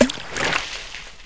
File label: Into Water; QuickDraw 3D 1.5; Gerbils!